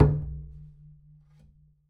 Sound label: perc, pizz; meatbass